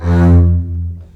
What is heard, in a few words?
String Collection, MARCATO; F2; East West - The Ultimate String Collection